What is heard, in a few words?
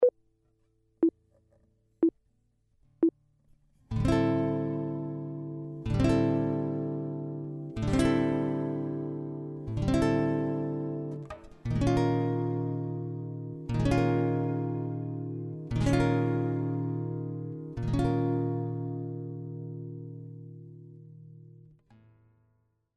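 chord, guitar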